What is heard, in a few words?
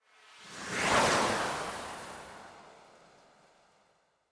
battle transition; The Warlock of Firetop Mountain